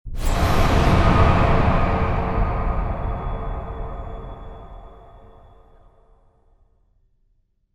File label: Scary sting